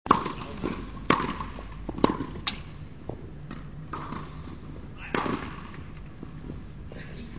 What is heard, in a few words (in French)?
ambiance 2 tennis